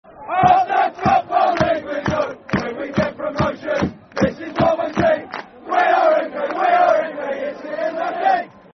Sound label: E I E I E I O Football Chant (Soccer Song); Milton Keynes Dons Mkdfc